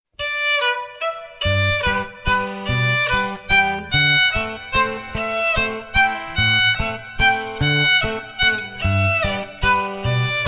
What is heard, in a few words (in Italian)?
Mazurca, campanaro